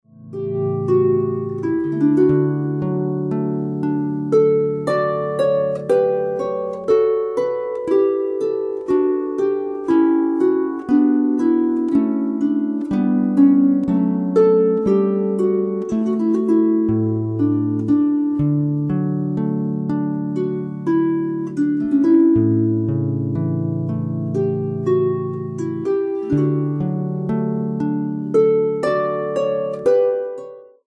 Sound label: Prelude